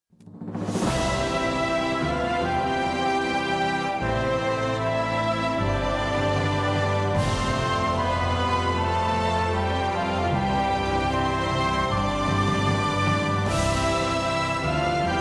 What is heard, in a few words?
anthem